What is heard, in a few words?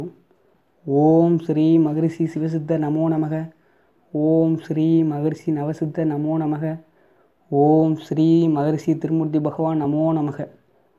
Ringtone